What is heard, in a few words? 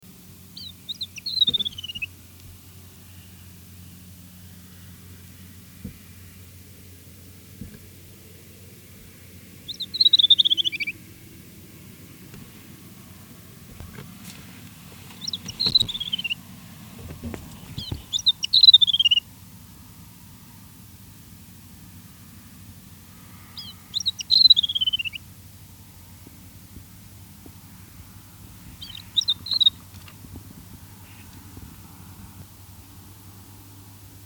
Asian Desert Warbler song; Oshayger KSA; A. Al-Sirhan